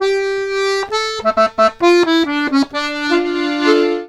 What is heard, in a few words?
accordions; USB Soundscan vol.40; AKAI